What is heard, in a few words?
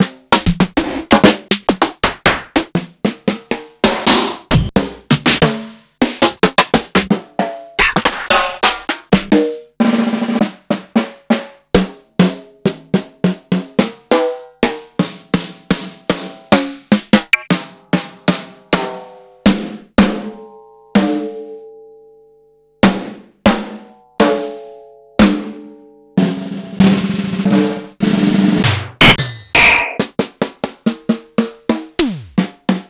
Yamaha Motif ES-Series